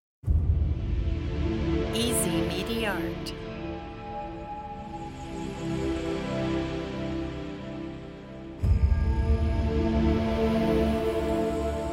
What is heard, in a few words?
epic hero